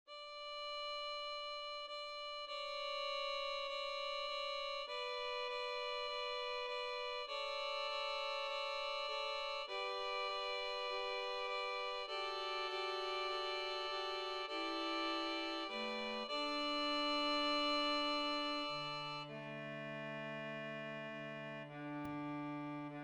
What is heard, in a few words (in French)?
auld lang enfants